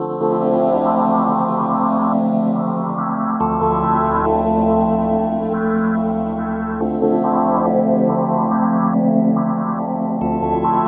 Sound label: Bpm141 Logos Pad Loop Dm, Dubstep